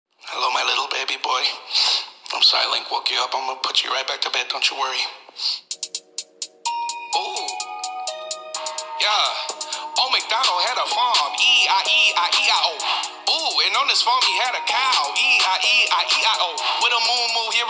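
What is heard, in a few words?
nursery rhyme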